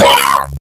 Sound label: pain; awesomenauts